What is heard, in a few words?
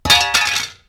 metal; Timelock VR